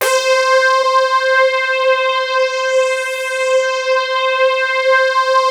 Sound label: POWER LEAD; Rubber Chicken Software Sample Bank